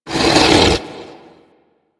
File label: Rock Elemental Attack Vocal; Warframe; Brawler